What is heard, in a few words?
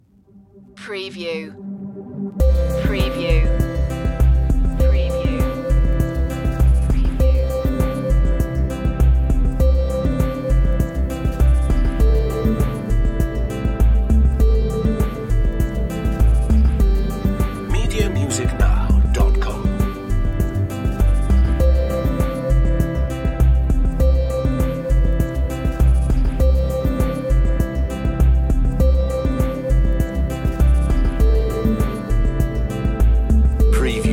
underwater pulse